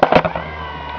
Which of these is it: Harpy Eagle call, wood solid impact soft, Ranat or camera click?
camera click